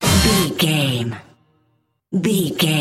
got dance sting, Dance, EDM, Club, Exercise, Aerobic, Aerobics, Spinning, Workout, Disco, Eurodance, Confident, Energetic, Cheerful